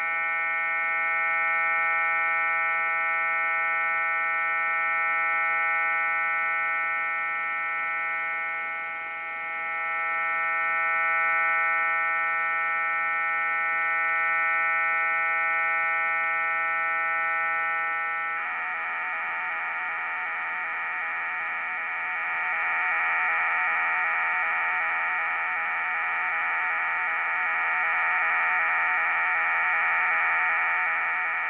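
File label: 4x166.7 Bd standby and test pattern; System in standby condition and changing to traffic state with repetitive test patterns; 4 x 166.7 Bd QPSK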